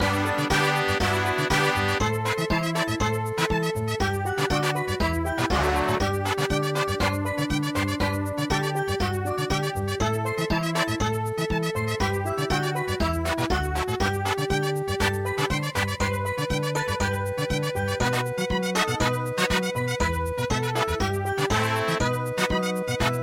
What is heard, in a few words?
mambo